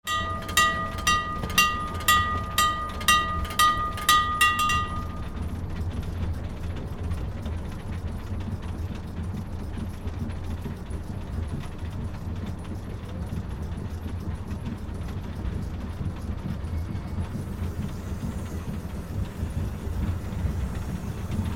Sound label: old train cabin inside and bell